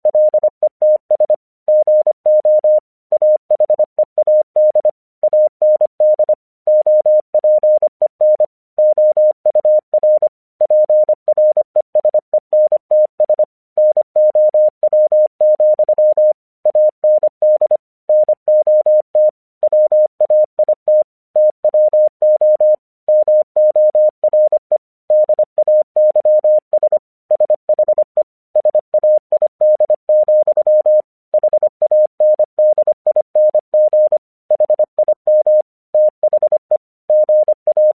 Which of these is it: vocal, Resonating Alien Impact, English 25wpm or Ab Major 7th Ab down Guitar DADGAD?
English 25wpm